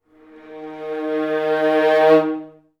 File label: VA CRSC D#2, Advanced Orchestra; Best Service